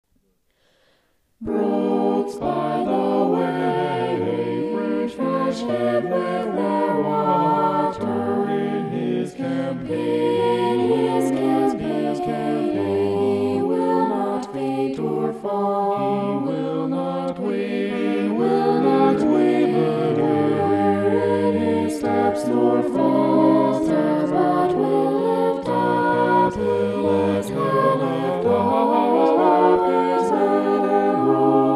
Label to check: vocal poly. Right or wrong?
Right